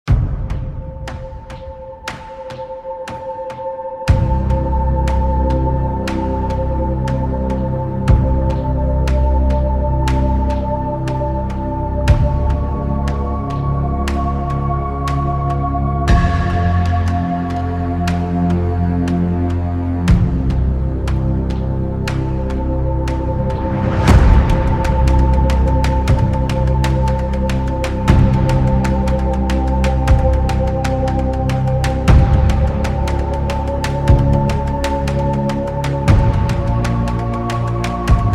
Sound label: rock phonk